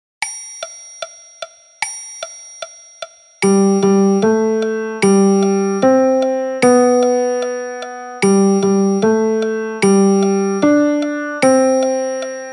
Piano Version; Happy Birthday To You; تولدت مبارک

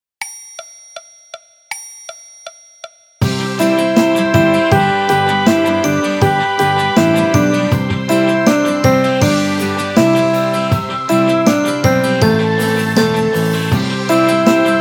Piano Orch

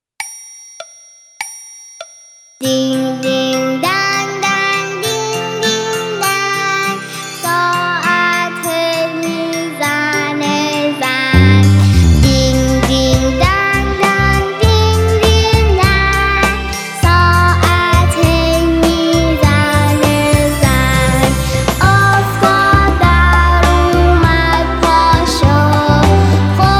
Twinkle Twinkle Little Star in C Vocal

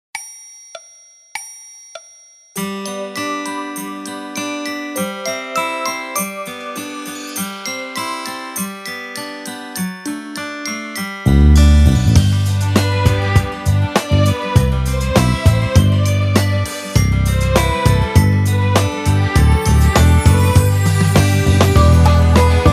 in G Orchestra Version; Twinkle Twinkle Little Star

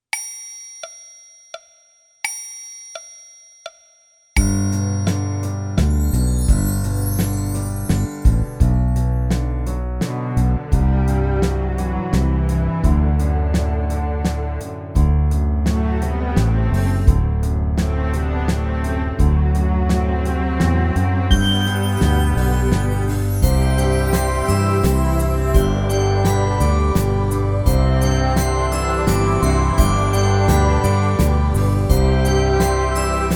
Lullaby Orchestra Version; Lalaee; لالایی لالابای

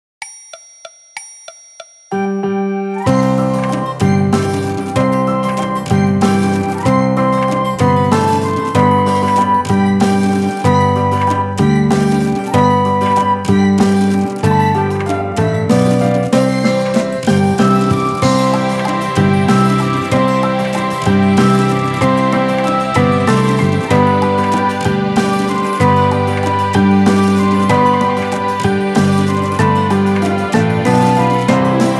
Piano Orch

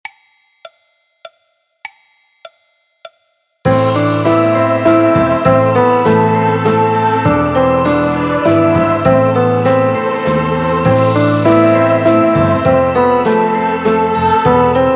Piano Orch

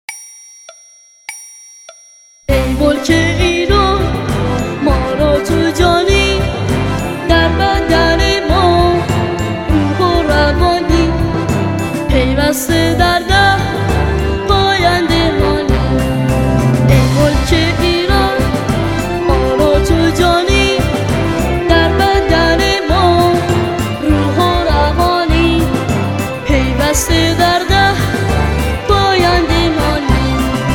Vocal

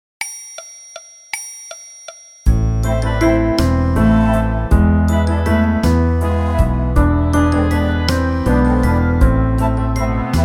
Piano Orch

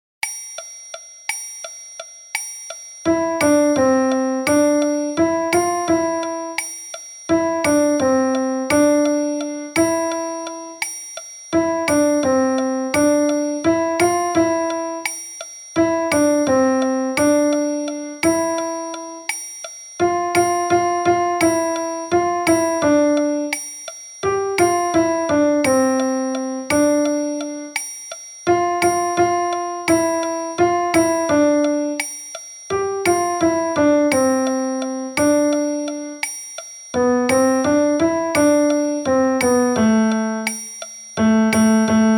Piano Version

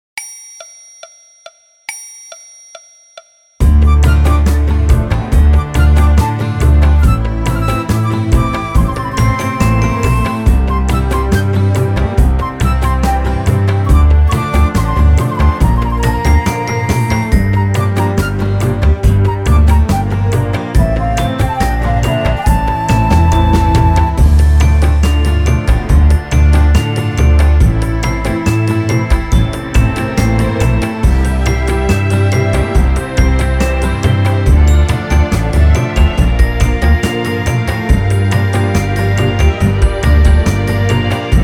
Orchestra Version; Mollamamadjan; ملاممد جان

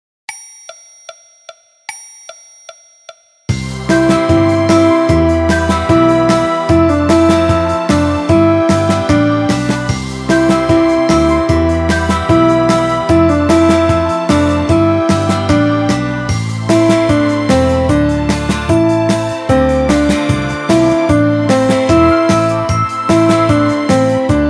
Piano Orch

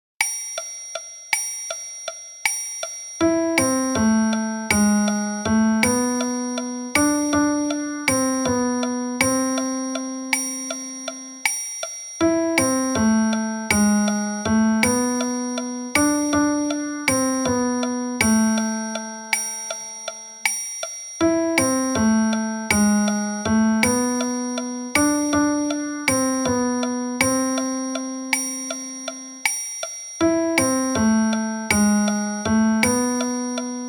Piano Version

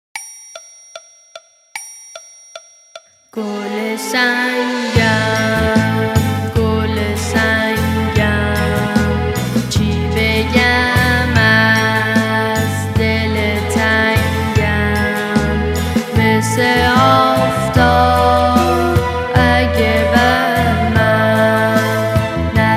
Vocal

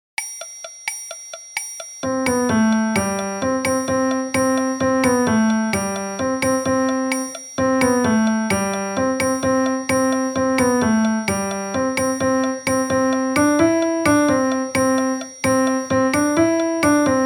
Piano Version

